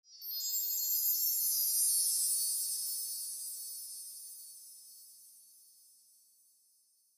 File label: chimes wet